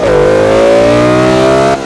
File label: second, vehicles; gmod; tdmcars; r8 v10